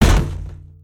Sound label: box fall; weapons; aronwk collection; ndaudio